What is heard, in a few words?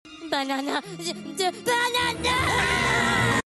banana scream